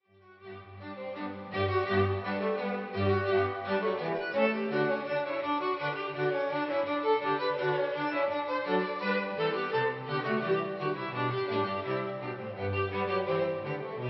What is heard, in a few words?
quartet; branderburg 3